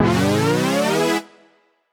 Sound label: up E, Poly Chord Hits Ramp Up; JPEGG; future rave